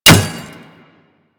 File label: Bullet Impact Metal No Whizz